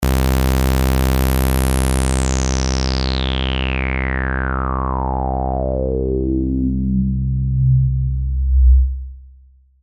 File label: saw lp filter sweep